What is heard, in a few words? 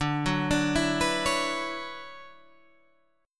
D Major 13th D down Guitar Half Step Down